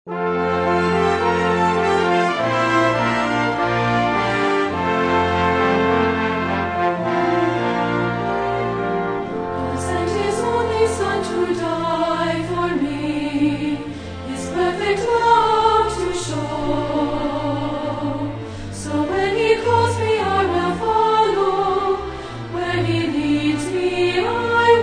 Singers With Orchestra

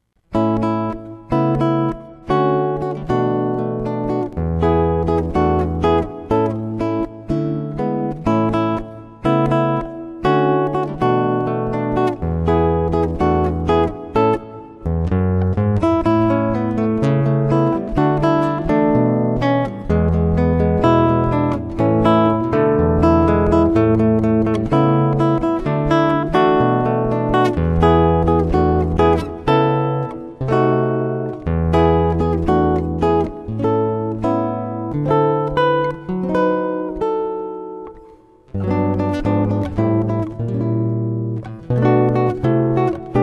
Guitarist

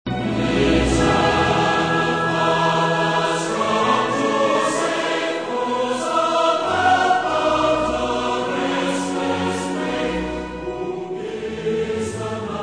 Orchestra and Choir

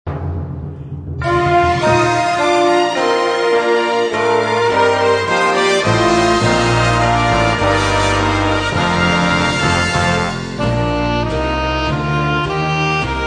Instrumental Hymns

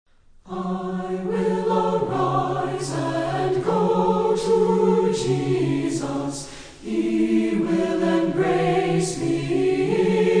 Singers And Orchestra

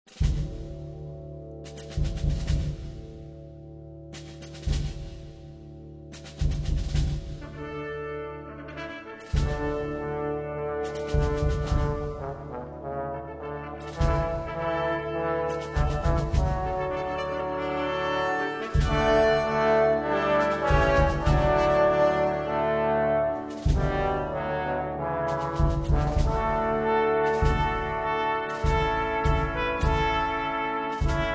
Brass Choir; Onward Christian Soldiers

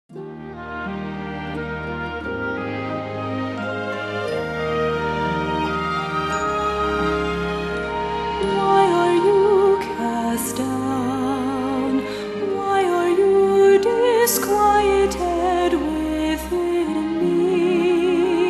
Choir And Orchestra

